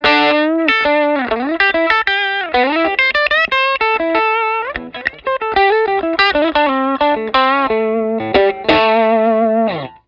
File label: TELE BRIDGE WARM DRIVE OVERDRIVE LICK, t bridge pickup